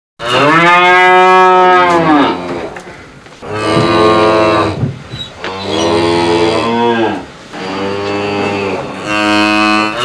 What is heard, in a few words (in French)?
vache